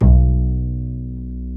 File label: Bass, Roland